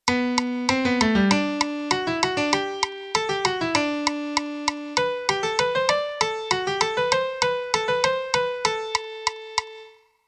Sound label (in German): Melodie; theorie; Melodiediktate